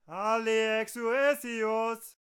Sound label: Divine Arbiter Attack Vocals